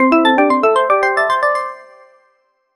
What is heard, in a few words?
a happy sound effect, positive